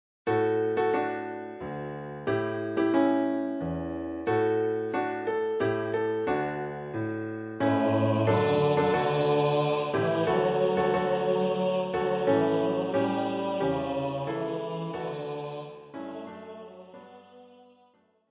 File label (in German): O Tannenbaum